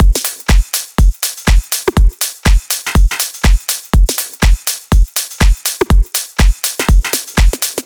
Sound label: 122 Full Drums